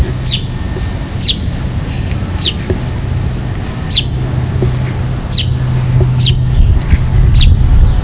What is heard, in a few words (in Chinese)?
樹麻雀